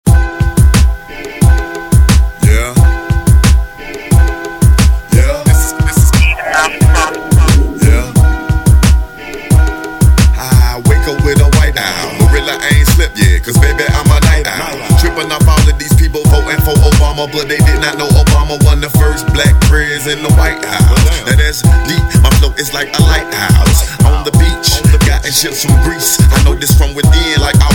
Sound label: Laugh